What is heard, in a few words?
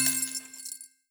win loop end sound, slot; greed; slot eyes